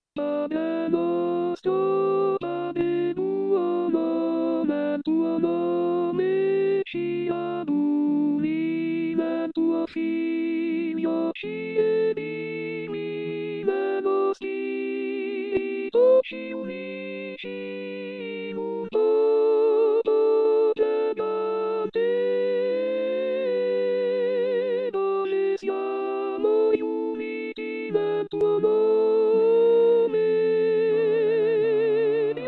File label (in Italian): Contralti